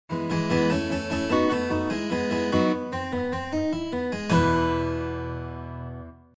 12 String Guitar

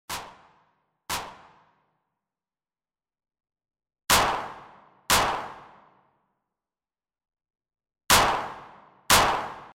Whip

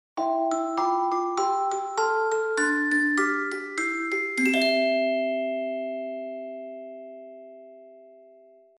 Celesta

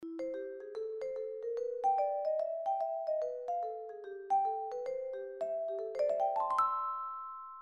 Vibes

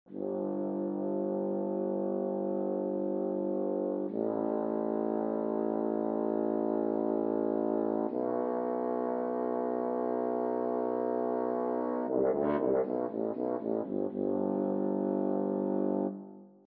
Alphorn

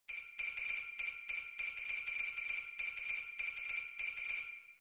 Sleigh Bells

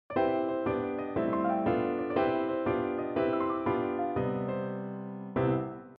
Piano

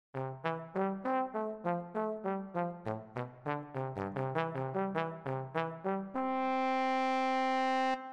Trombone

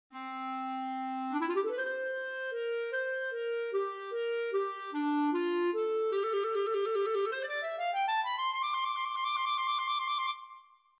Bb Clarinet

